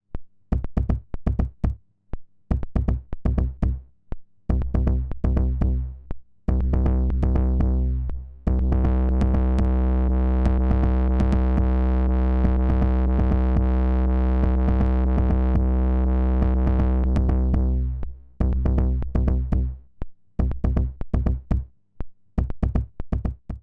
KICK ME KICK2; Snazzy FX

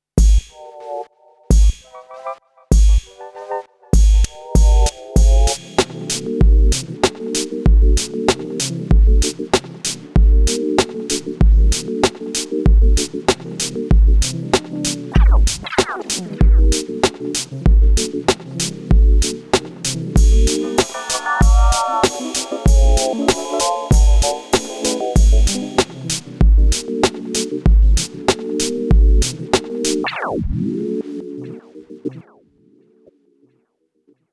Korg Wavestate MkII Wasted Hip Hop Factory Sound

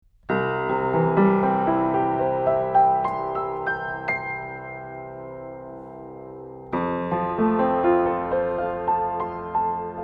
Piano Wide Stereo; Austrian Audio OC18

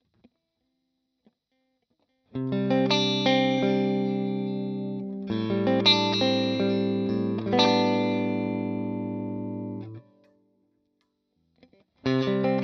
Clean

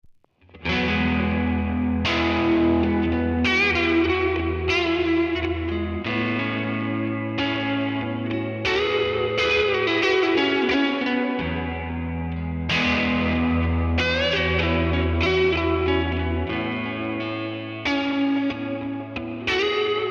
Tone King Imperial MKII Ambiclean

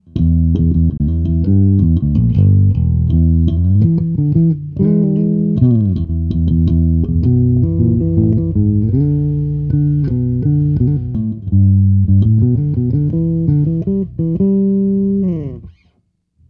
Warwick neutral

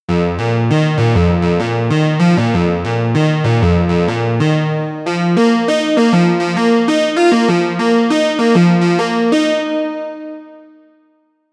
Saw Pulse

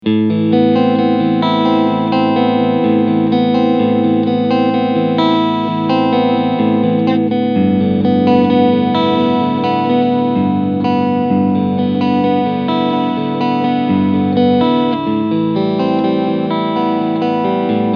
Clean Position

